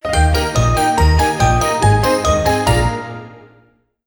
Fanfare; Sleep Style Discovered!; pok-mon sleep mobile gamerip 2023

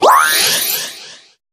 Jingle Dress

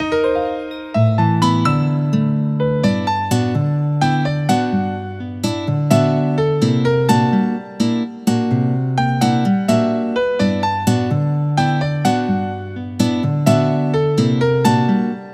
BGM Hole End; Clubhouse Games: 51 Worldwide Classics

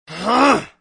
Growl; Sonic.EXE; Tails Nightmare 3 Demo

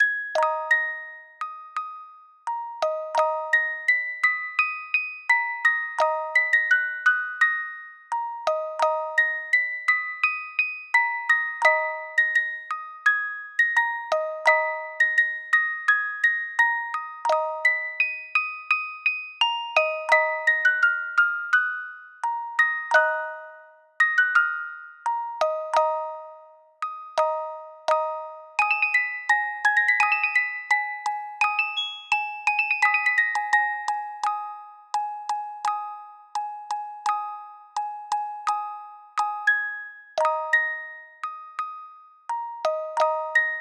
Folk (Music Box); animal crossing new leaf welcome aamiibo soundtrack

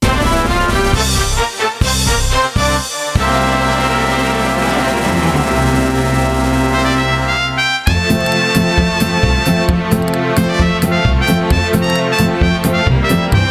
Mariachi

